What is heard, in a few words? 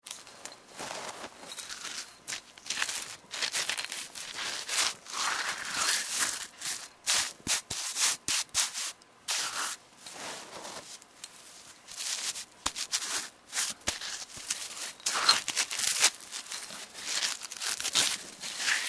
Foley Moves Dirt Gritty Smear; Debris; Materials